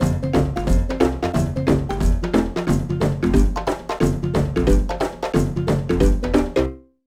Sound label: Failure; Jambo Safari Animal Rescue; NDS